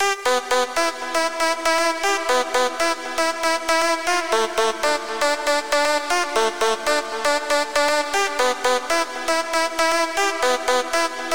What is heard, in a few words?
key lead 118 bpm; loops; sc essentials